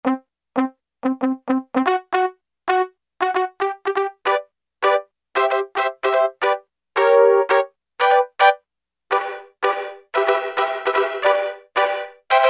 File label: Fast Gate 200 msec